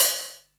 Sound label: Hat Open, Drums, Roland MT32